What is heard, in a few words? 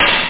OPEN HIHAT